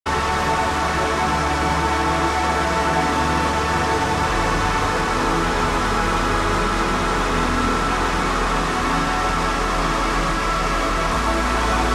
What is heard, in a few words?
pop ambient